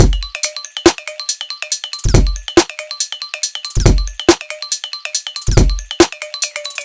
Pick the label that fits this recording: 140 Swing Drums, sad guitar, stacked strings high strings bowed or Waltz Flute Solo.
140 Swing Drums